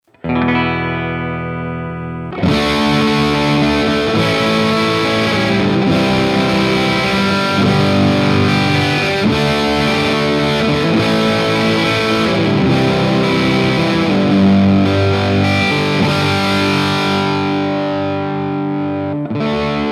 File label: Muff VS Stomp